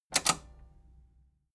metal button robust spring click disable fast

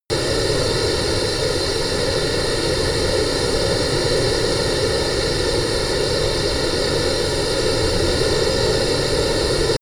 plane honda private small turbine idle close

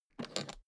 suitcase open latch lock fast

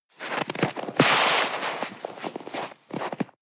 snow grab

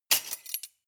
cutlery large drawer grab hard

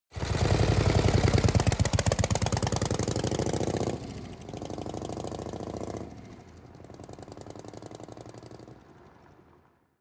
motorcycle harley drive set off

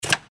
switch

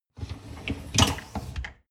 dishwasher upper tray draw in short

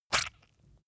leather impact mud medium squelch

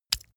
leather impact mud medium

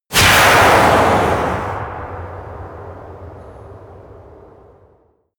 missile large fire airplane close

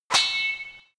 sword reverberated impact

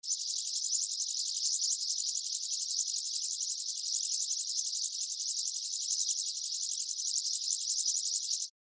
atmo birds insects

